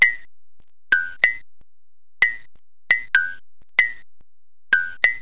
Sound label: BLINK EYE